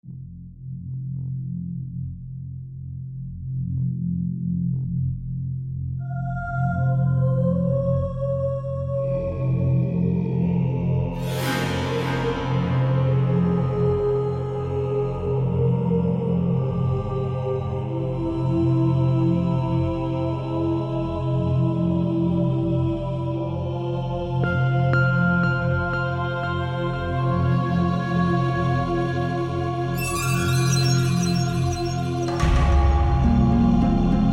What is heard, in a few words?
tombs idle; hero of sparta; iphone